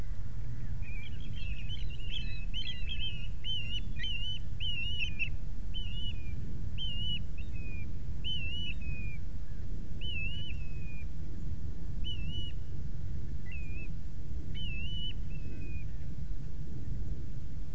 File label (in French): Pluvier argente Pluvialis squatarola